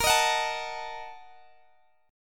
Bb Major 7th Suspended 4th Sharp 5th, Guitar, DADGAD; x,x,20,20,21,19